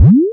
Cute Bounce Jump

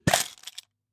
Coin Gold Box Open

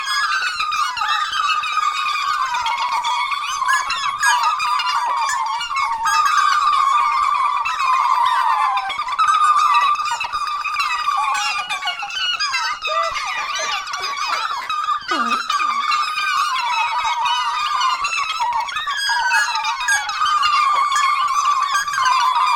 Cartoon Alien Crowd

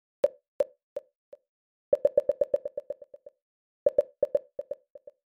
Tech Loop 124bpm

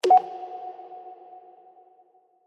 Ham Radio Bloop

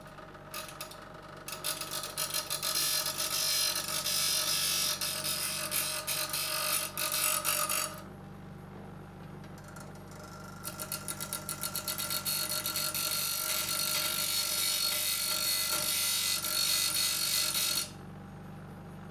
Grinding

